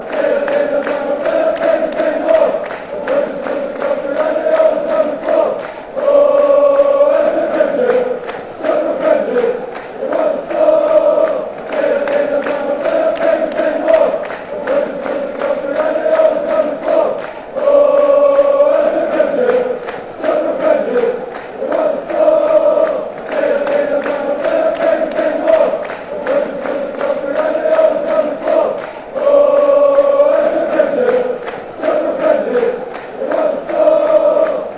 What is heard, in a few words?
chant; club brugge